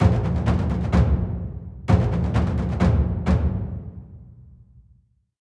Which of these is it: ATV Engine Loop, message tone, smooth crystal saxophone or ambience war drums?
ambience war drums